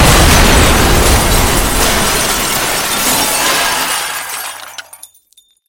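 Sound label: long loud ringing of breaking dishes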